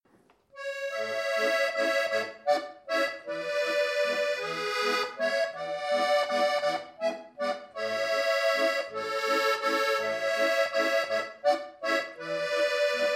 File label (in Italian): Valzer Fantasia